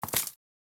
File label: grass drop Into